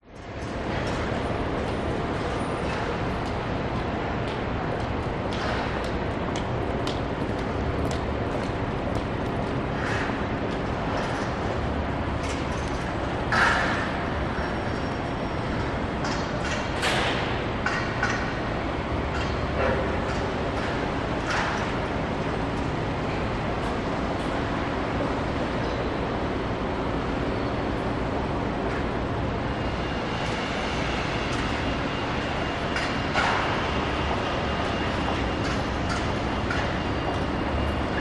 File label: public places city alley distant traffic and construction few pedestrians city hu; city hum